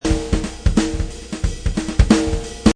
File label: Jazz drum loop at 180 bpm.; Drum Loops